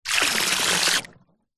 household water spray hose jet into swimming pool short burst close up surface; zapsplat